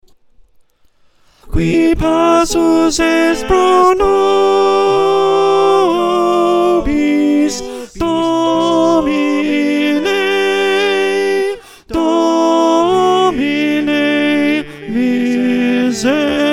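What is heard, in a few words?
alto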